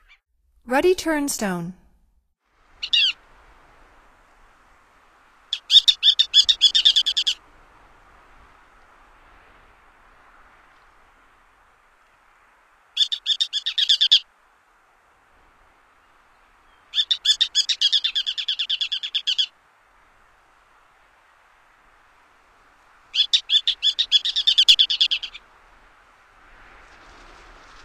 Ruddy Turnstone; North America; Stokes

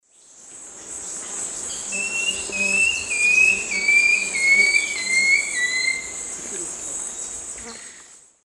Deconychura longicauda, song; S. America; Eastern Lowlands

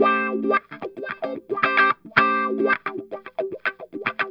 Guitar A7#9 Funk

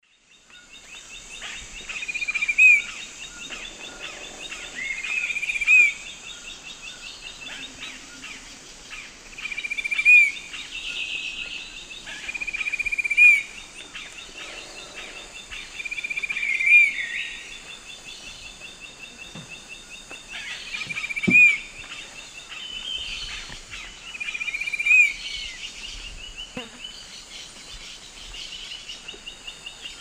Pygiptila stellaris, song; S. America; Eastern Lowlands

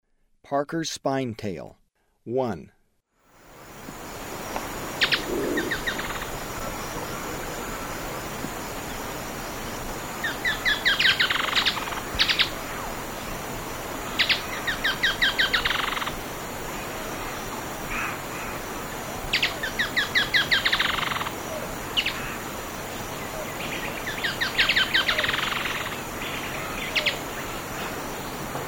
Parker's Spinetail; S. America; Eastern Lowlands

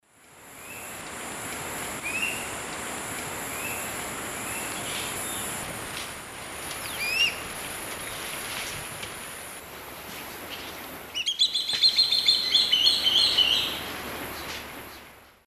Elanoides forficatus, flight calls; S. America; Eastern Lowlands

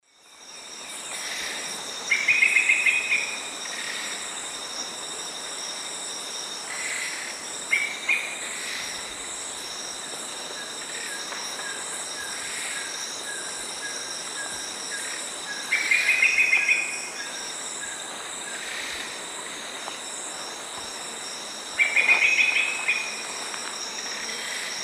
Turdus lawrencii, calls or song; S. America; Eastern Lowlands

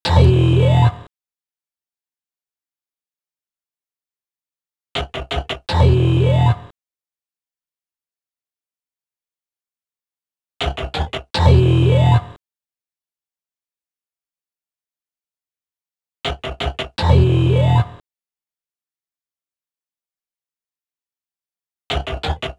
Bass Hip-Hop

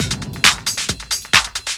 Drums Techno

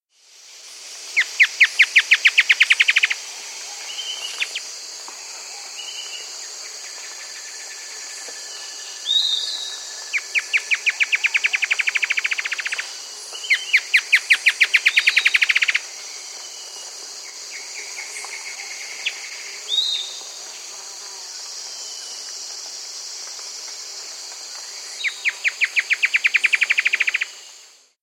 Dugand's Antwren; S. America

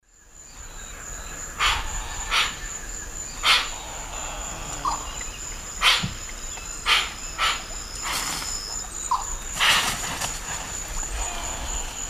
Opisthocomus hoazin, calls; S. America; Eastern Lowlands

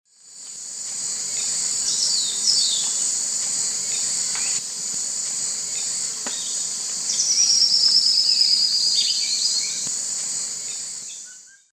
Myiopagis caniceps, call and song; S. America; Eastern Lowlands

